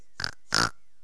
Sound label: pig